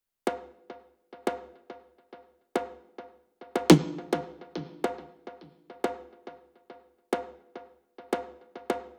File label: perc loop 105, Pop, Drums; Loops; Slate; SD PASADENA Pop Sample Pack